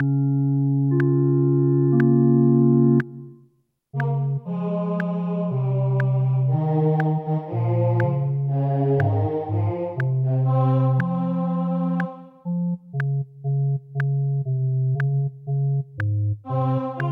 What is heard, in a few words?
O clap your hands; Gibbons